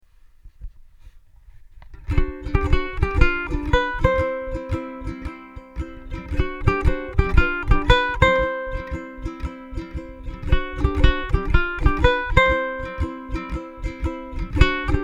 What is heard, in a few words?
vamp both parts, Hawaiian Vamp Chords & Picking on Ukulele; Hawaiian song; signature riff connecting two parts